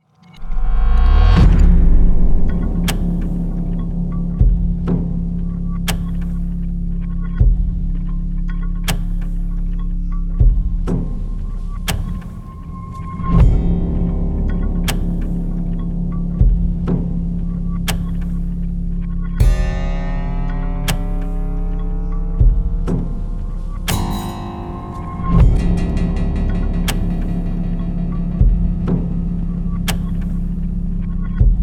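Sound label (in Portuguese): piano de família; weeklybeats 2026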